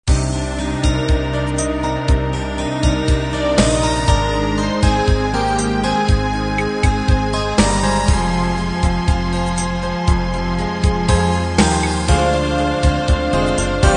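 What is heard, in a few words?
unplugged 8 beat intro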